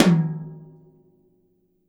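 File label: Jazz kit tom, Drums Acoustic; USB Soundscan vol.10; AKAI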